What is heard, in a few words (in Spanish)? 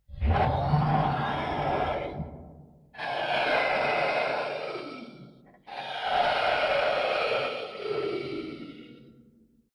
terror